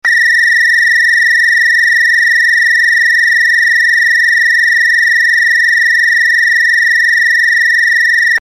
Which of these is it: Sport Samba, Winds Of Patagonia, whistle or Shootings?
whistle